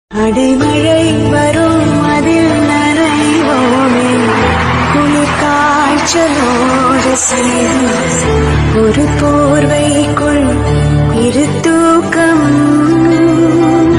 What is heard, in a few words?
song cover, tamil